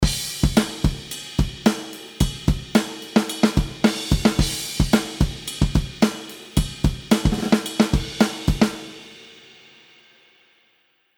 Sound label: Drums Dry; DBX 160A